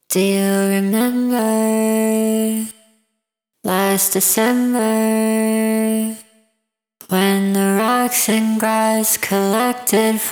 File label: vox